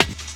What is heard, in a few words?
SD, Drum & Bass, 175 BPM; Ueberschall; Drum & Bass Flash Trackz